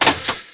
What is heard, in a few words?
open